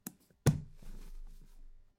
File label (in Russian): chemodan v gostinitse na krovat